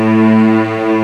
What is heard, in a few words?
STRINGS A2; ORCHSTR; AKAI S-Series CD-ROM Sound Library VOL-2